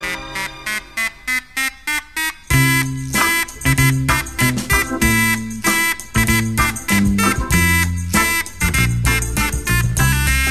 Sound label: French; Pub Ricore